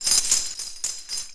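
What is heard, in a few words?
Chain Movement; Civ4 warlords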